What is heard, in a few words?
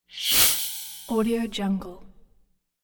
short cymbal swell